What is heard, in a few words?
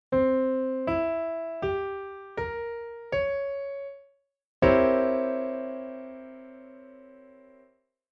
chord pf 7b9